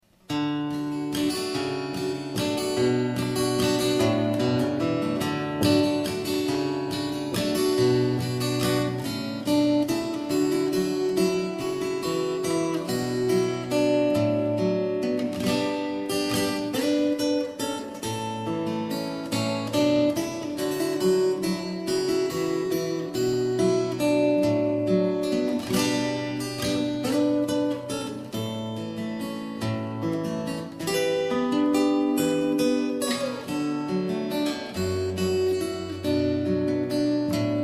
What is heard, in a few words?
fingerstyle, guitar